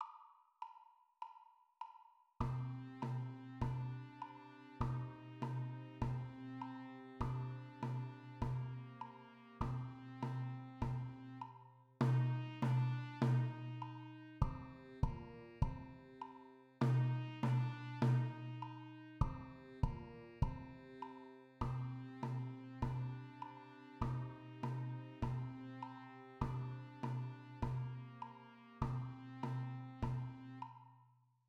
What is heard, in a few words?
perc